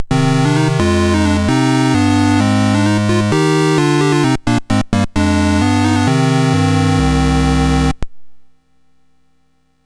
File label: Dragonworld sid MOS6581R4